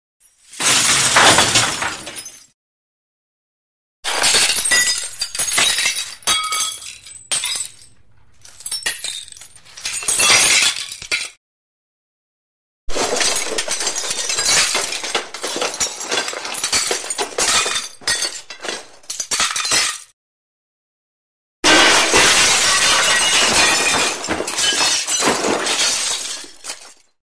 Glass Falls Heavy Heavy Glass Debris Falls & Hits Fl; Hollywood Edge Foley Sound Library